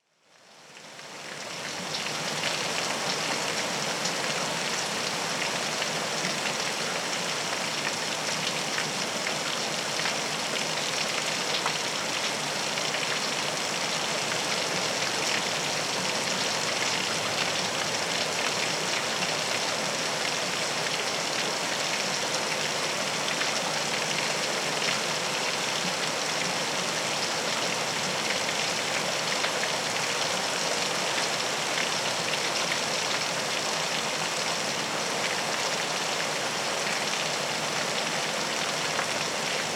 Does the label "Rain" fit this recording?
Yes